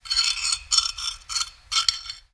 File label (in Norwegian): skraping